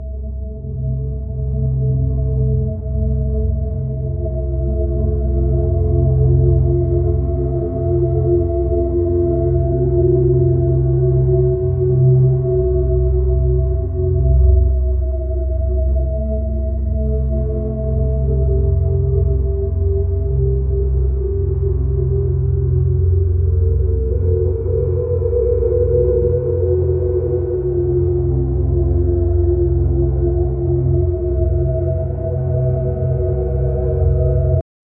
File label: dark ambient drone slow